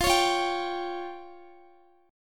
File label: F Suspended 2nd Sharp 5th F down Guitar; Fsus2#5; strummed; 4F,4A,5C,5G,5C,6E